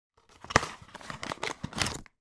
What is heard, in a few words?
pregnancy test remove from card box, household